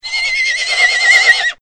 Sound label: Horse Neigh Sounds Car Horn